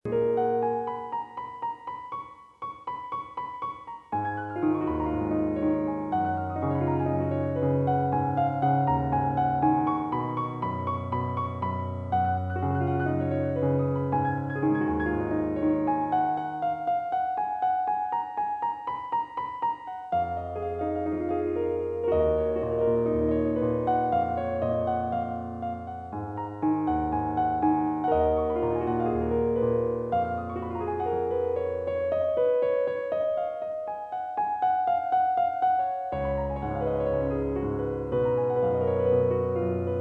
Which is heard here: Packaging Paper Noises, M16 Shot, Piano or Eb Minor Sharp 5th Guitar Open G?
Piano